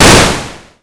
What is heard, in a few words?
Break